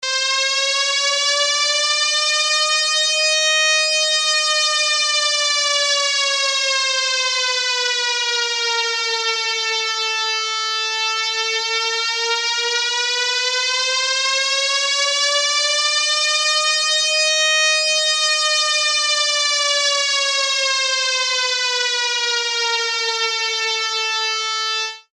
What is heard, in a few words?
siren air raid short